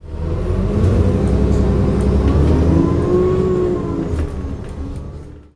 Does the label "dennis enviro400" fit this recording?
Yes